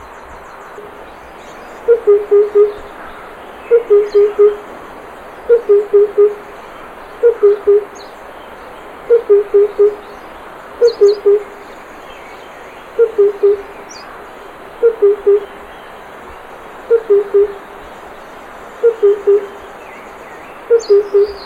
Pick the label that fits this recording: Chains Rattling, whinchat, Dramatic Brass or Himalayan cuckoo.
Himalayan cuckoo